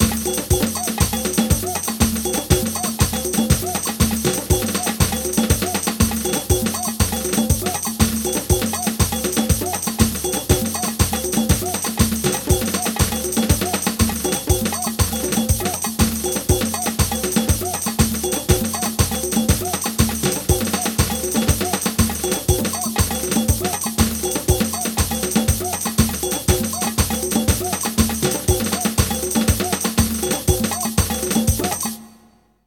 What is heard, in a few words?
Samba Perc